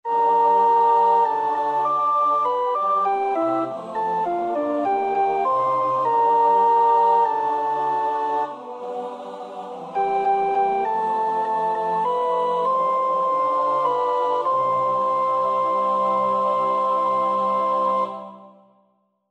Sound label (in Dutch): sopraan, koorpartij oefening; Schütz (Heinrich); Matthäus Passion SWV 479